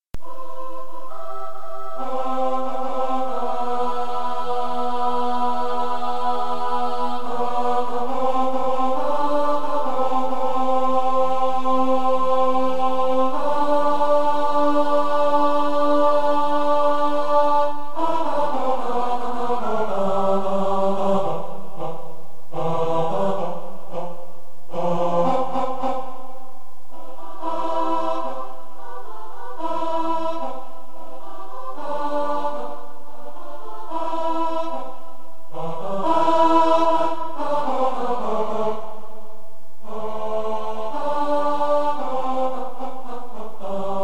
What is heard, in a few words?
Tenor